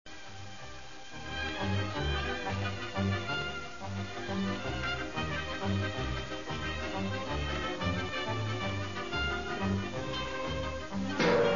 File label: la rhumba